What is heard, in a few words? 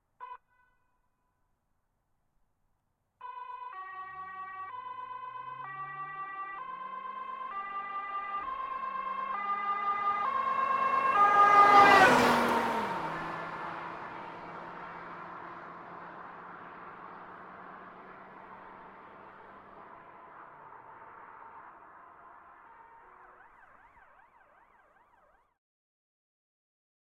Volvo V70 ext fast by siren twice stop, Police Car; Volvo V70 2006